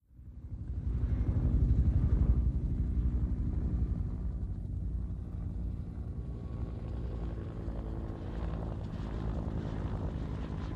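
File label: Ah1 Cobra Heli By Distant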